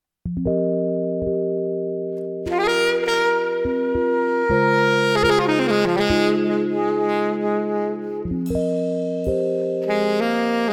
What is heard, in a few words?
Lounge Saxy Beach; fullscreen scroll glitch fx